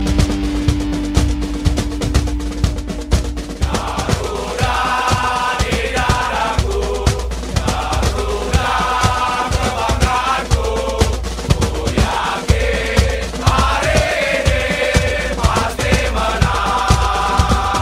Ringtone

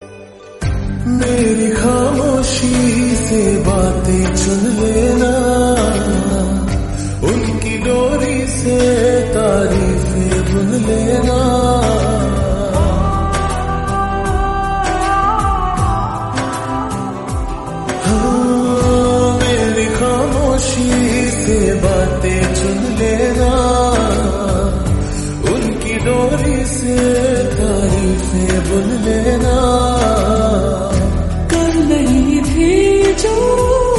Ringtone

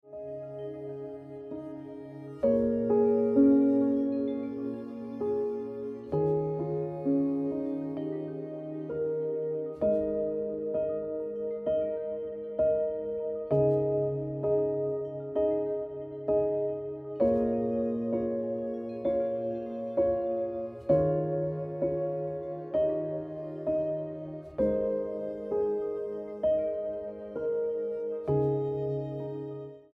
Piano Music

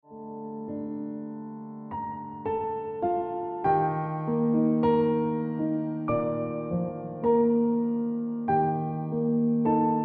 Piano Music

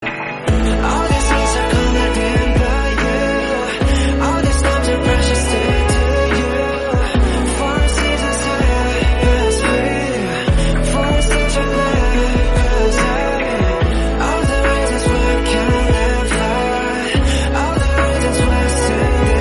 Violin Duet

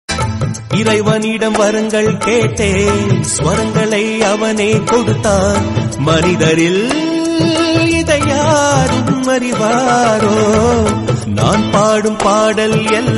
Tamil Ringtone; Kaasi

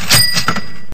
Cash Register Ringtone

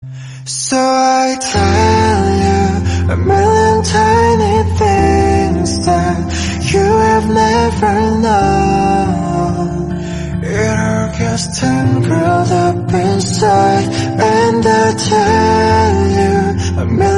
Korean Song, ringtone

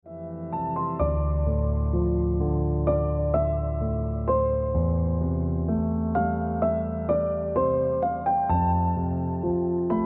Ringtone Piano Music

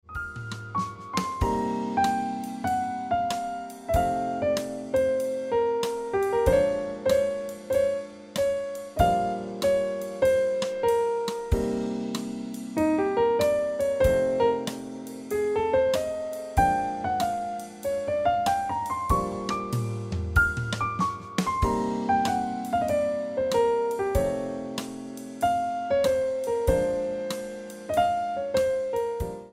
Ringtone Piano Music

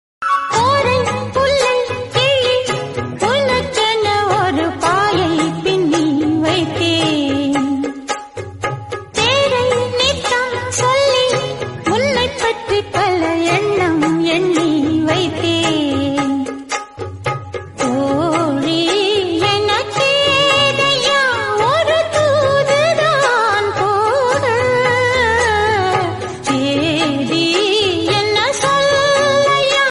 90s Love Songs Tamil

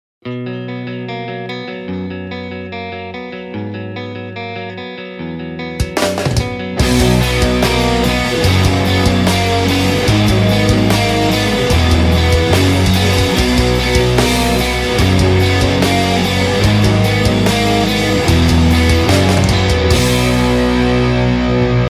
Ringtone

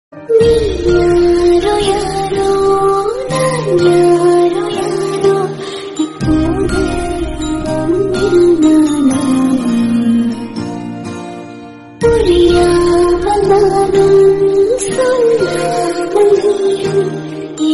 Tamil Song

